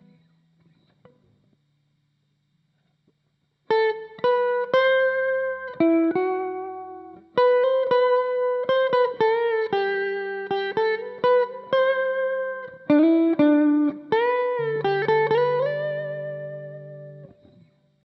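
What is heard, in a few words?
electric guitar amp; AKG P420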